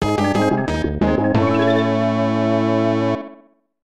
Lose; oraga land shusai 1995 snes

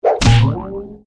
Bat hit Tennis; Rabbids Big Bang; Android iOS Windows gamerip 2013

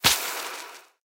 Attack Spittle Damage; Mighty Party; Android

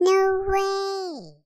Fall; Perky

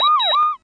Game Emergency Appear

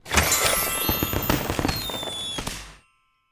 Rewardscreen Open chest; candy crush saga gamerip

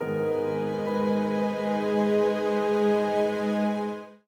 Sad Stinger; dying light gamerip 2015

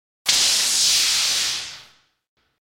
scifi zap shock

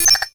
Reticle Lock; Ruins

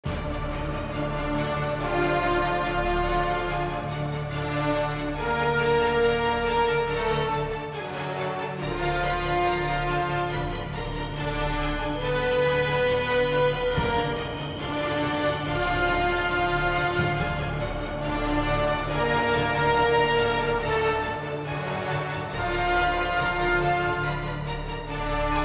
Tension; Morbius Tension #2; Spider-Man 3

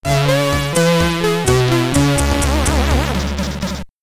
Get Ready! (SID Stereo); Alloyrun; Commodore 64

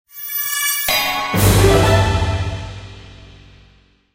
chapter clear; Over Eclipse; Android

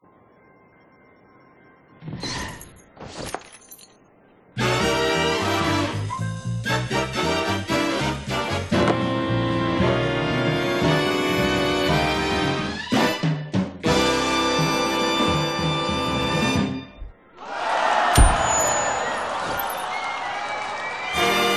Live in Concert (No Vocals)

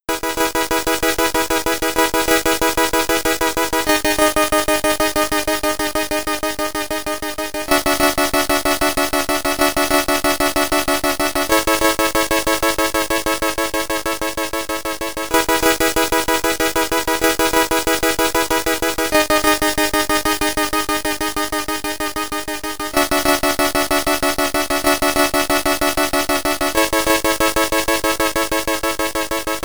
On-Foot Sequences (SID Stereo); Parallax; Commodore 64

